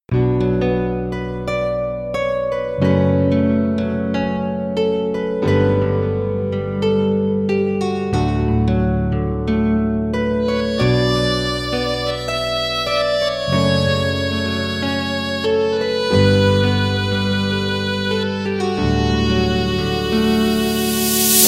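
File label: instrumental Ringtone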